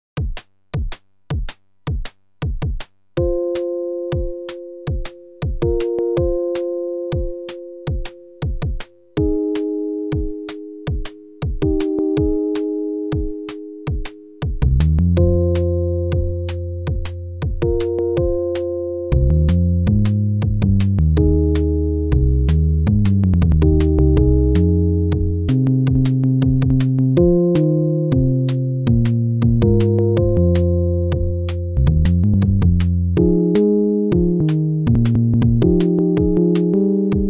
mellow dnb opl; weeklybeats 2024

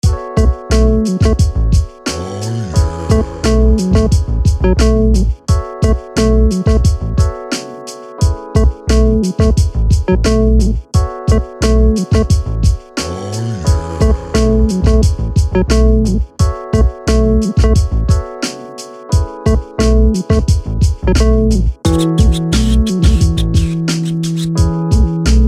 citypop boom bap

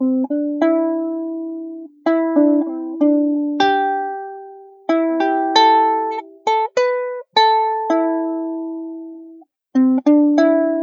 uke jam; weeklybeats-2014

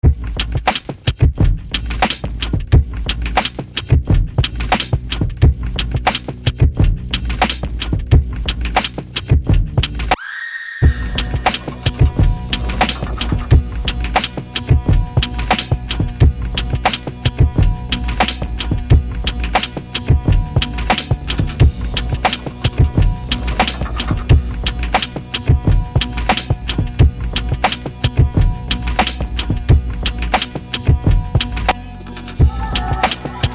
dusty drums; weeklybeats 2026

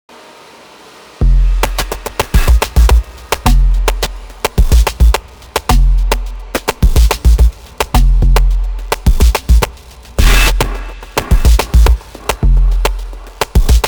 melancholic rhythmic noise; a gate opens up

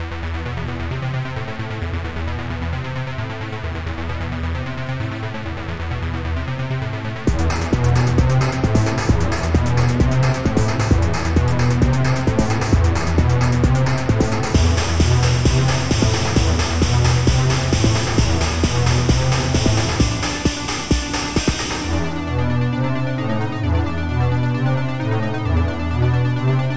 8 bit black metal d beat; weeklybeats 2024

